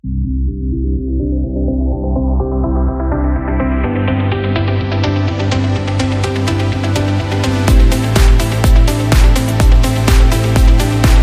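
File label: Healthcare, motivational corporate music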